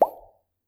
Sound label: plop, bubbles